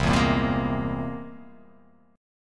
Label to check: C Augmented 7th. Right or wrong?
Right